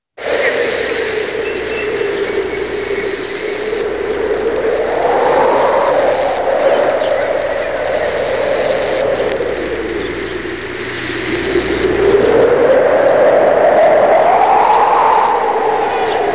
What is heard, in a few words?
Locust Swarm; Halloween Sound Effects